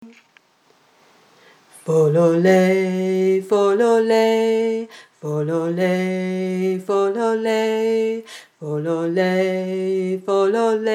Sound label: Harmony low; The Derby Ram